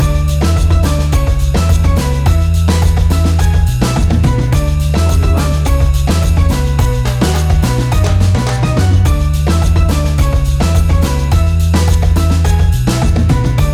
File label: African Reggae Fusion